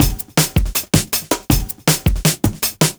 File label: Drum Loops, 160; DriveItHome; M8; Electronisounds